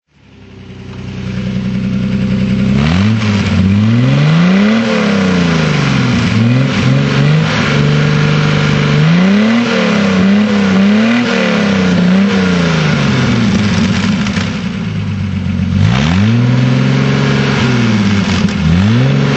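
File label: austin healey 100 6 sidepipe throttle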